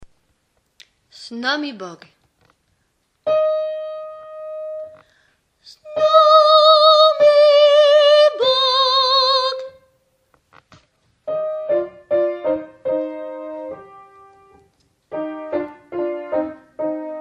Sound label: snami bog, tenor